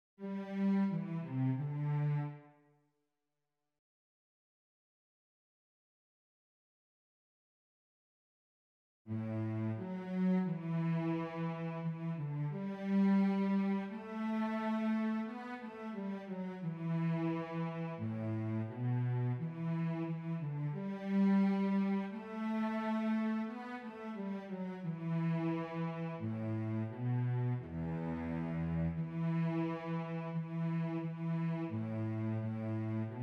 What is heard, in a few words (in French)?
VIOLONCELLE